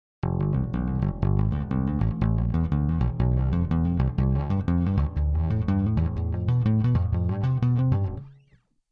Basso Shred

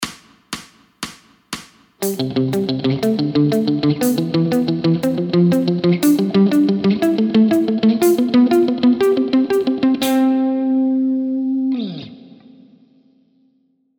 Intervalli di Quinta

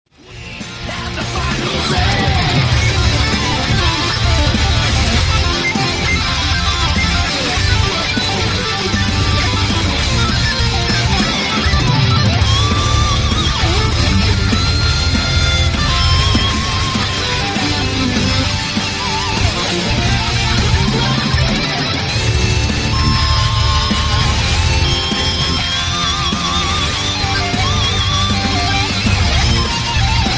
assolo due lick

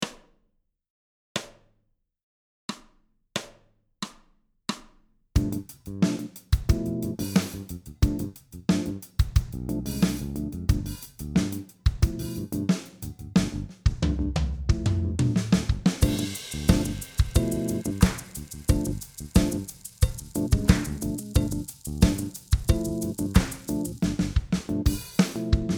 Base G7

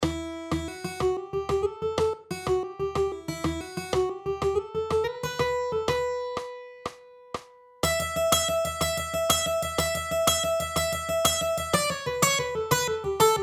assolo

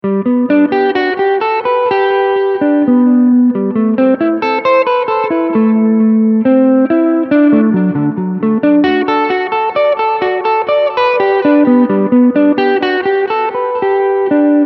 arpeggi V posizione

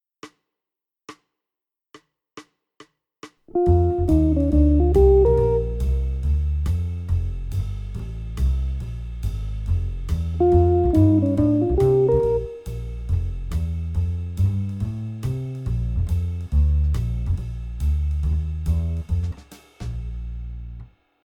Esempio V7 Medium, guitar

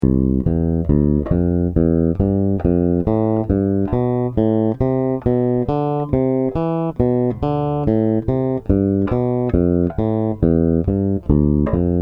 Basso Pizzicato a 4 dita; Basso Virtuoso

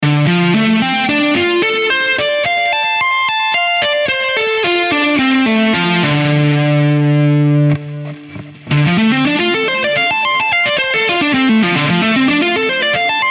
Re min7

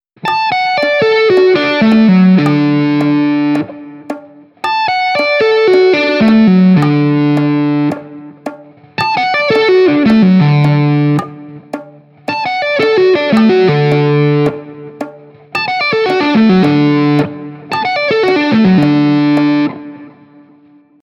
Posizione Fondamentale Discendente

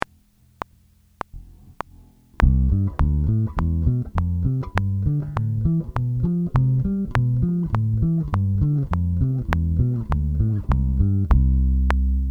Pizzicato 4 dita crome

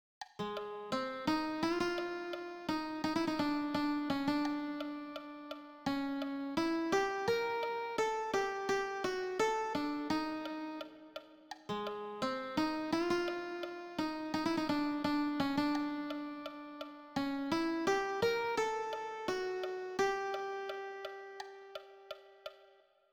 swing gitane melodia

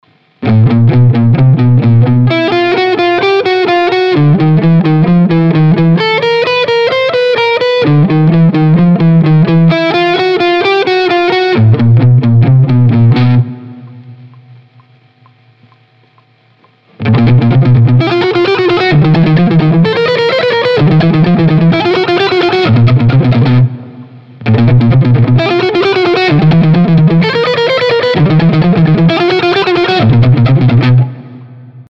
Salto di tre corde

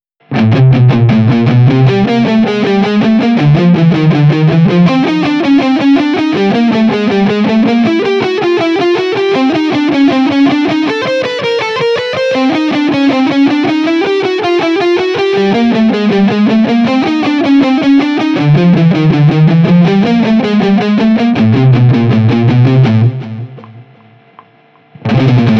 crom salto 1 corda

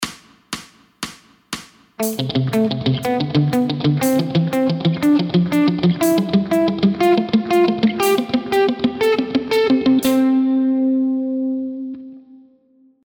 Intervalli di Sesta

